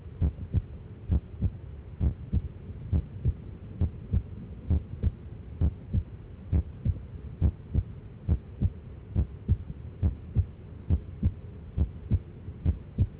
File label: Heart sound slow frequency